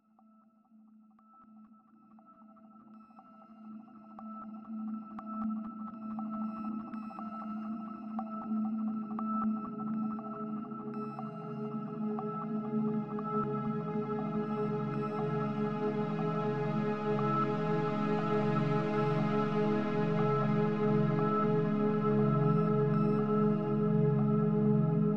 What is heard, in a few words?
Moody ambiance